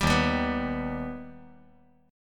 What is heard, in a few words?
Eb Augmented Eb; strummed; JGuitar